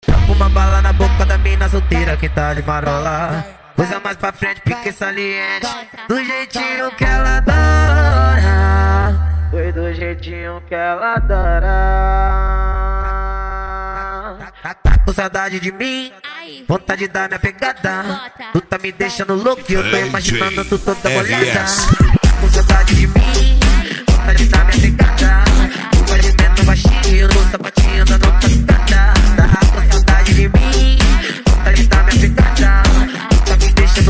eletrofunk; cd equipe revoada especial fim de ano

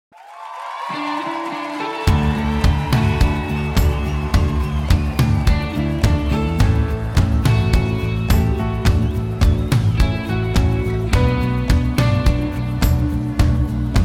sertanejo sofrencia e romanticas

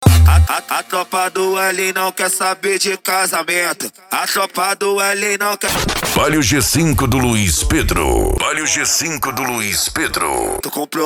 funk mega bass e forro; urupa ro

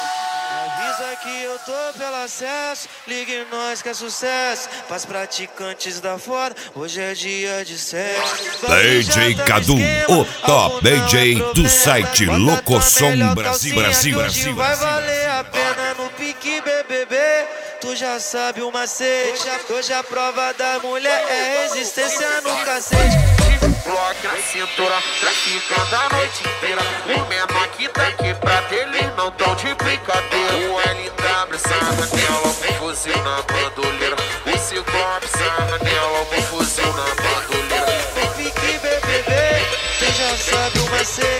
mega funk; set mega funk vol2; especial mega funk vol2 agosto

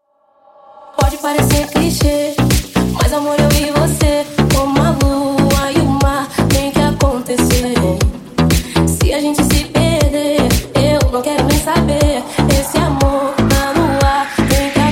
sertanejo remix

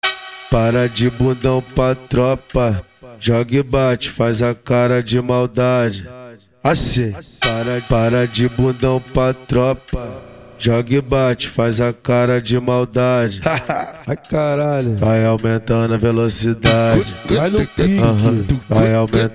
esp de cavalgada

